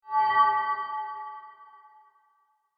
Guitar Chord Musical Transition Reveal Chord Swell; Guitars (Sound Effects:Musical); Sound Design